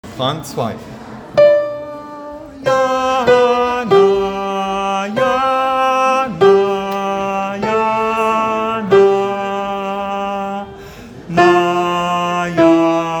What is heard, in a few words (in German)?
Sopran